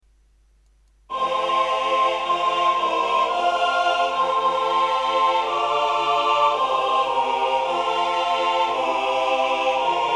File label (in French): Oratorio de Noël tutti, Chants